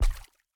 Maneken Step Soft Water; Game rip; MiSide

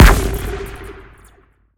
Hand Shot; Game rip; MiSide

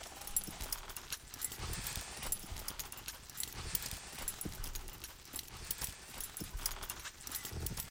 Maneken Movement; Game rip; MiSide

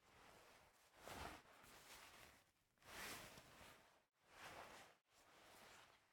Player Gamepad Inspecting; MiSide

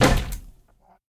Chair Impact; Game rip; MiSide

